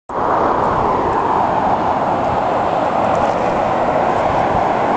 gorge sounds, Columbia River Gorge noise

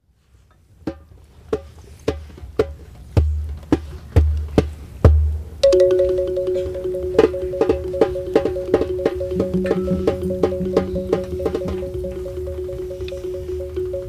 Drums Drums Drums; 2002 Rainbow Michigan

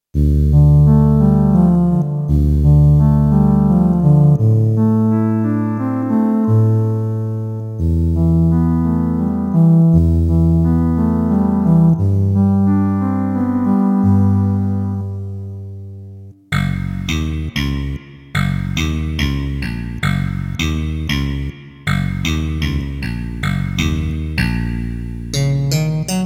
Funkit, Kawai K1; Bank 2 card A

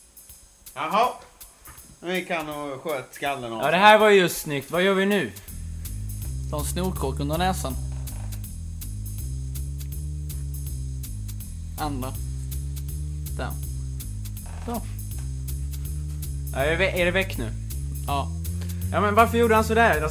Jazz

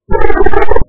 Techno Biscuit

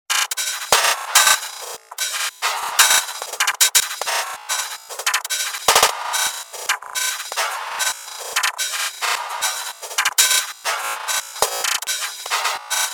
dub

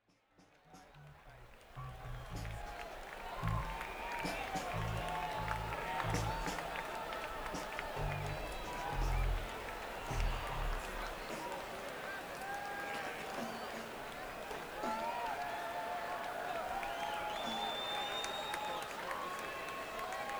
Crowd

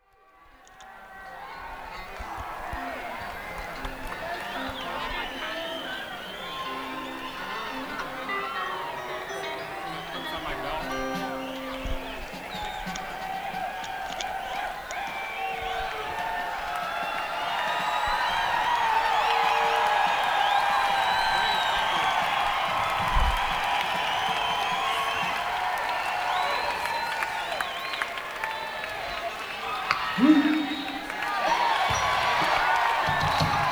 Crowd

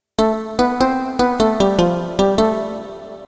Kalimba; Kawai K1; Bank 2 card A

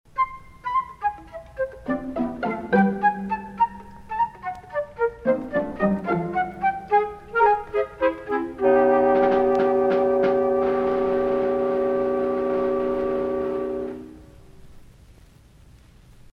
Light Comedy Movement

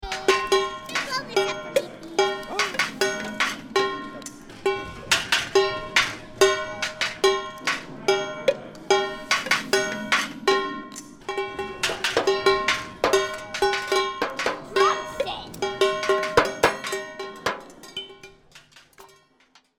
Drum Set

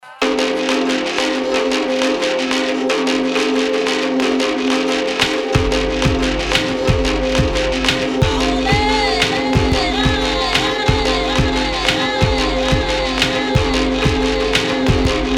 electronic band, cantador

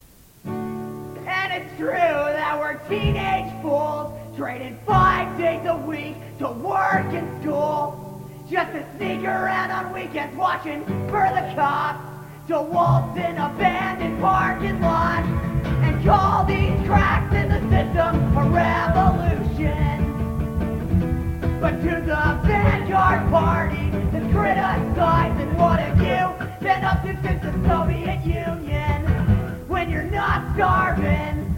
Waltz

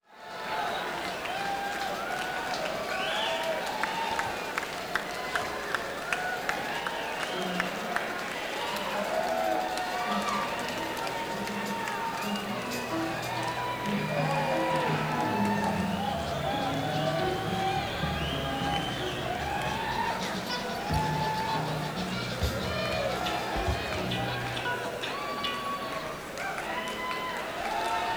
Crowd Tuning; senn

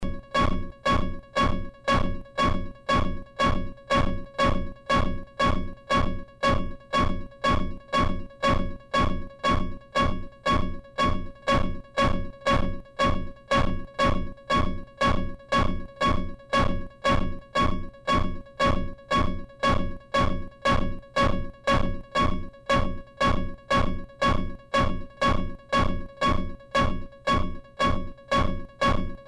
Sound midi fast

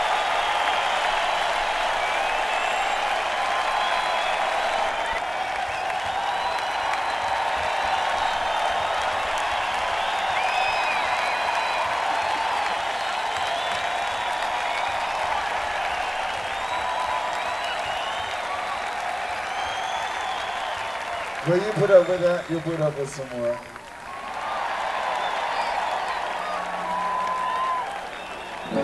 Encore Break; neumann km84i

